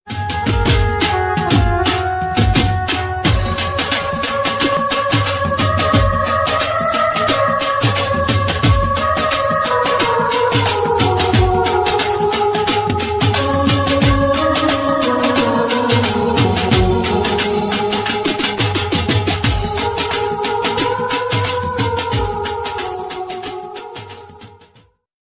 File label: gujarati garba music ringtone